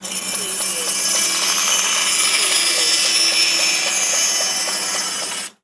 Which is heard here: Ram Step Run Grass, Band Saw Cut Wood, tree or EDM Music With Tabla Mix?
Band Saw Cut Wood